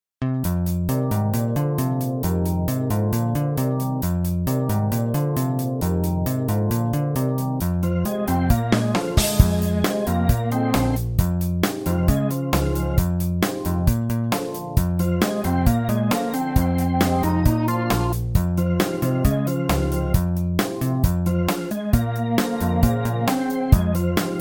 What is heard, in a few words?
Hammond B3 Organ; Virtual Fretless; Syntheway Master Hammond B3 Organ; Bassesland